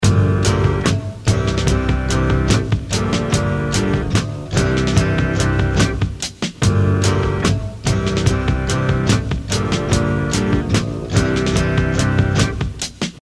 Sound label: Ding A Ling Blue Guitar